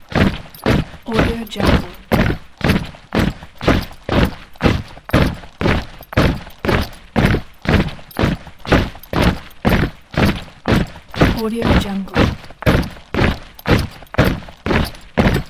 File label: soldiers marching loop